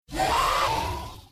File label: rage; Ben 10 - Tomb Of Doom